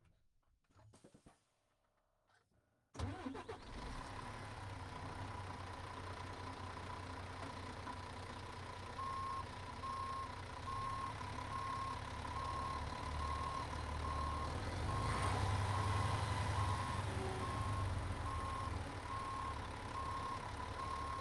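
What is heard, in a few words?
man lions coach onboard start reverse stop off engine; vp88; Sonniss GDC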